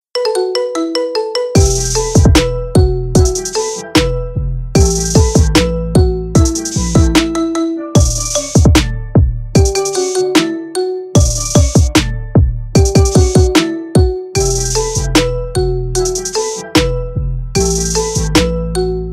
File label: Marimba Remix Ringtone